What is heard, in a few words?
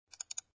Mouse Click Double